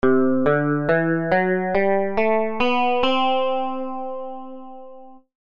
mode do lydien

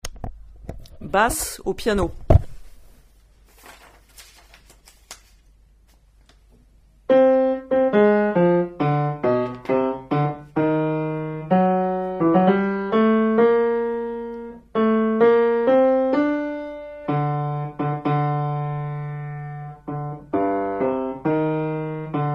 basse Piano; libera me

